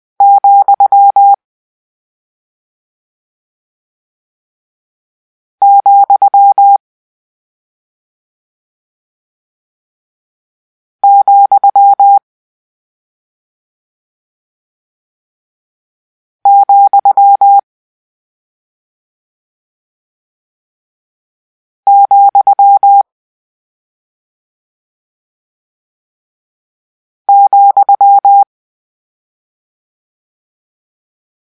comma, morsecode

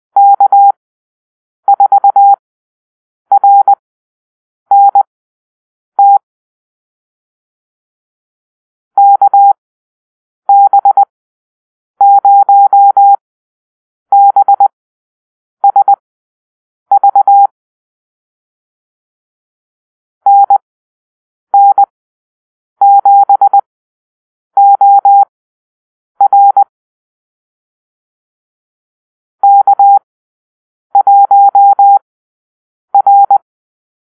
Call-Sign Drill, morsecode

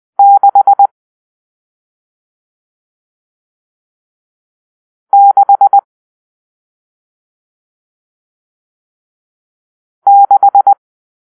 Numeral Six (6), morse code

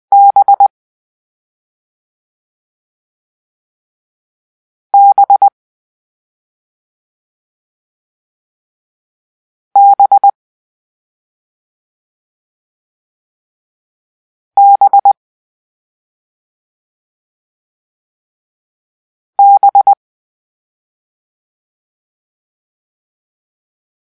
Bravo (B), morsecode

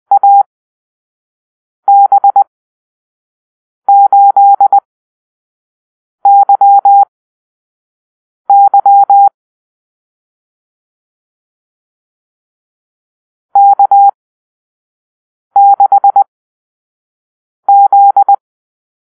Call-Sign Drill, morsecode

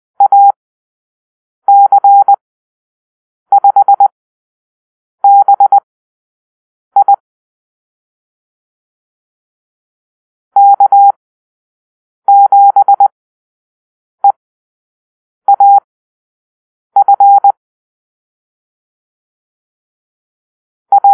Call-Sign Drill, morsecode

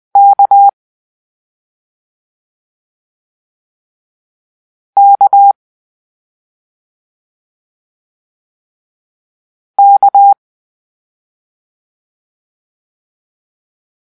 Kilo (K), morse code

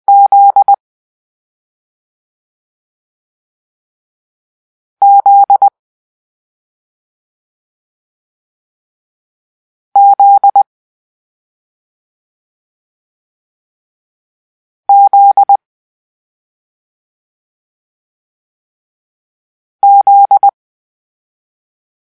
Zulu (Z), morse code

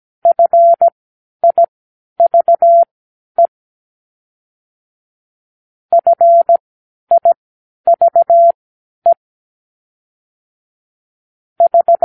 Numeral Five (5), morse code

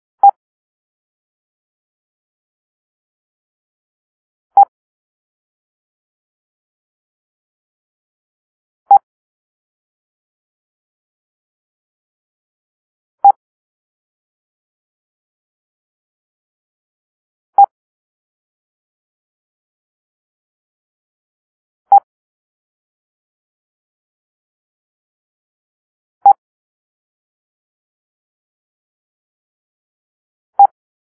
Echo (E), morse code

